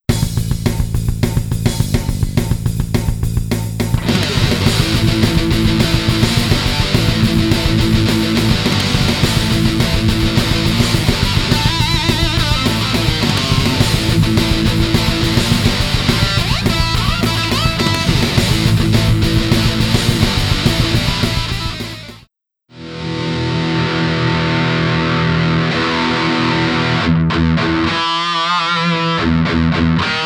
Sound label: sound check